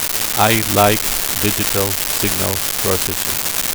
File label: noise